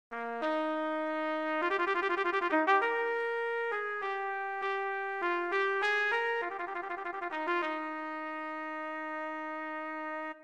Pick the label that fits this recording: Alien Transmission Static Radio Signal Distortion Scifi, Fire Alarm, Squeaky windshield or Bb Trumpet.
Bb Trumpet